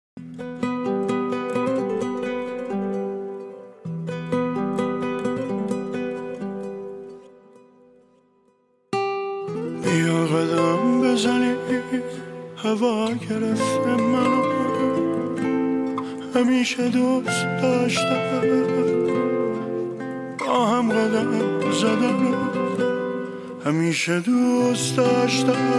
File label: Guitar